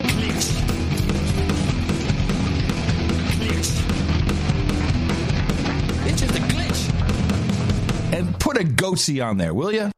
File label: Glitch Jingle